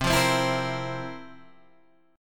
F Major 7th Suspended 4th

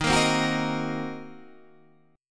D sharp Minor 6th D sharp down Guitar Drop D

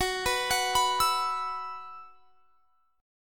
Gb 7th Suspended 4th Gb down, chord; Gb7sus4 arpeggiated

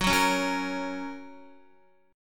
Gb Major Gb down Guitar; Gb strummed; JGuitar

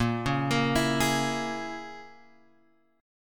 Bb Minor 7th Flat 5th, Guitar, Open G; 8,6,8,9,9,x

